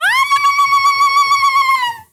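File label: FEMALE RAVE; Spectrasonics; Heart of Africa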